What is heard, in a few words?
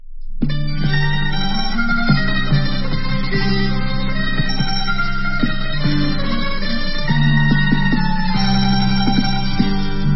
instrumental